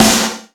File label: SD, Yamaha RY-10, Drums